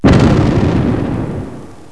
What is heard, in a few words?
boom